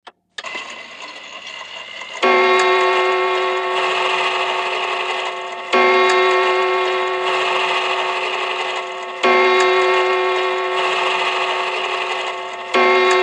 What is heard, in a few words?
Grandfather clock strikes 12 0`clock, much whirring of clock mechanism.; CLOCKS GRANDFATHER CLOCK: INT